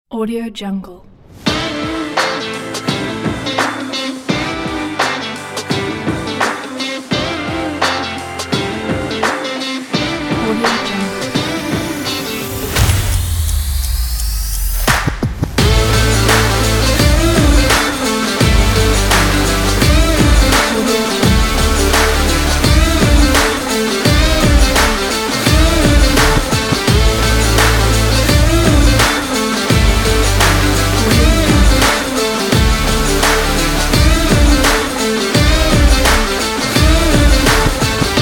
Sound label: stylish rock music